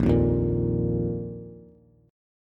Bb Suspended 4th Bb down Bass; Bbsus4 strummed

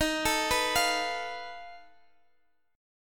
F 7th Flat 5th D sharp

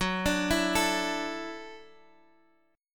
F sharp Minor 7th Sharp 5th

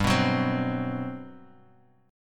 G Major 11th, Guitar Half Step Down

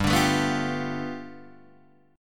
G 9th, Guitar Open D

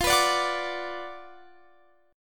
Gb Major 7th Suspended 4th Sharp 5th F, chord

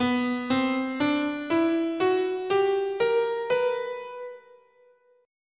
B Neapolitan Minor, scale

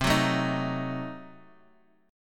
C Diminished 7th down

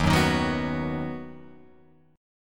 Eb Minor Sharp 5th Guitar Open F; Ebm#5 strummed; JGuitar

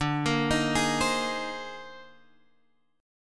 C Minor 7th Sharp 5th D; Cm7#5/D arpeggiated; JGuitar

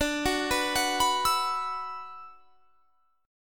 D 6th Add 9th D; D6add9 arpeggiated; 22,21,21,23,24,24

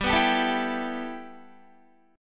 G 6th Add 9th G Guitar, chord; G6add9 strummed

